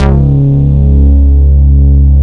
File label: Ultimate Bass Root C#; Vengeance Samples